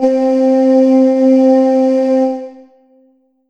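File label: Ensoniq ZR-76 Synth Vox C4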